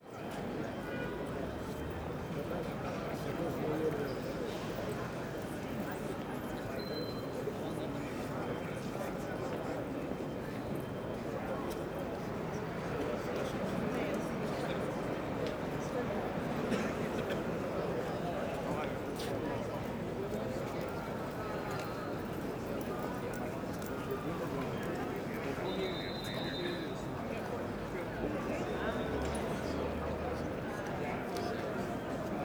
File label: Crowd Exterior Large Size, City Voices, Footsteps, Distant Traffic Stereo; Ambisonic; Ambisonic Crowds